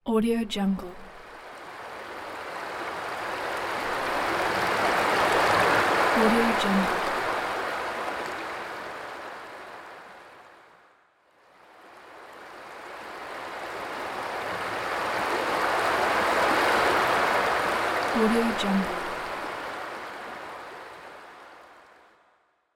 smooth sea waves

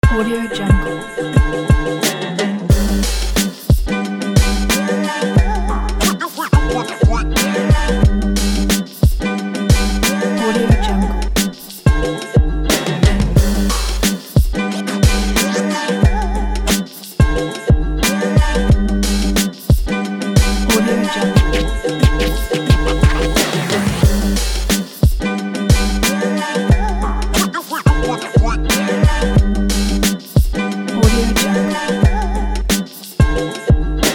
vlog hiphop

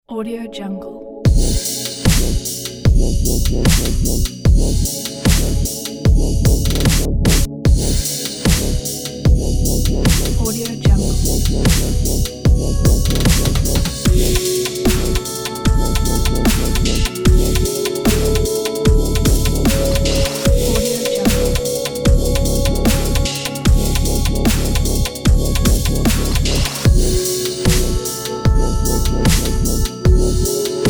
dubstep ambient

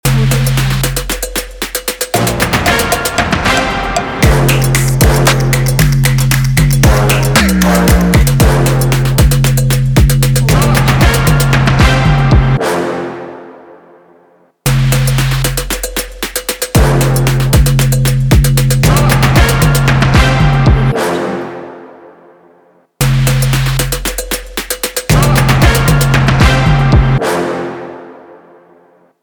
stylish fashion trap logo

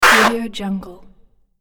platform hit

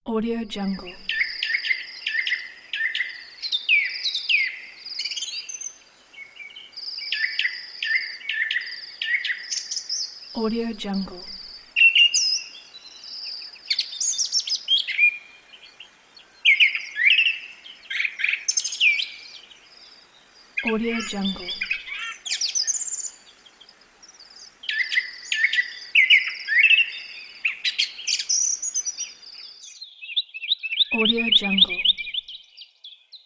birds

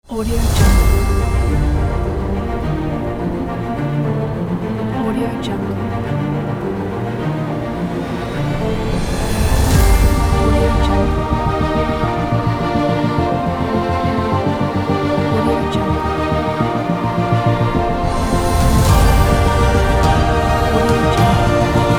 epic ident

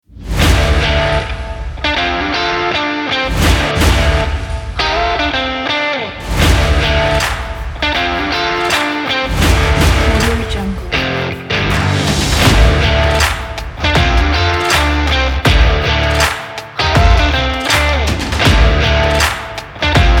action stomp rock trailer kit

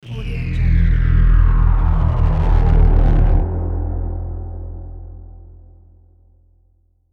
bass drop

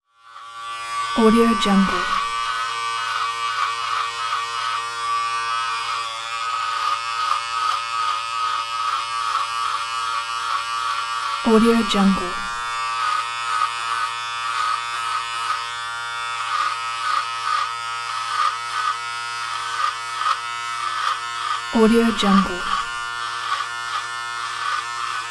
hair clipper

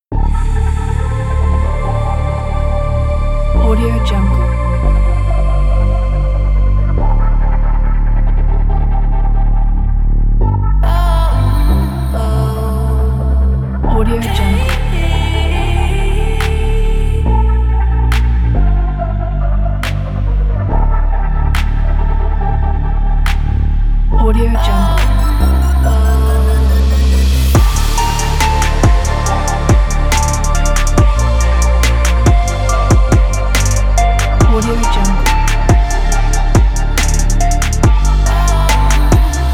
hiphop pack; sfrecordsmusic

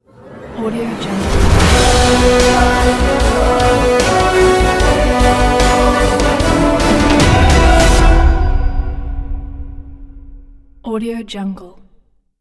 big epic intro logo

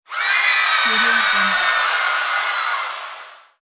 roller coaster roar

